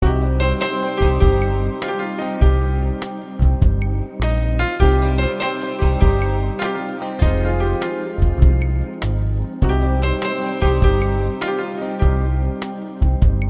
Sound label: Instrumental Music